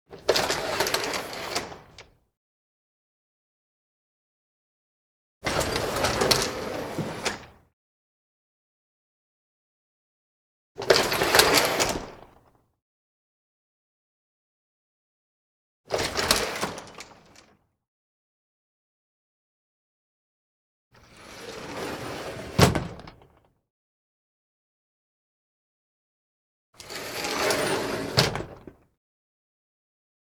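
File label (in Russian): створки дверей расходятся и сходятся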